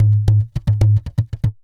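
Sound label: tantango groove; Best Service; World Colours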